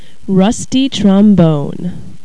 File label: rusty trombone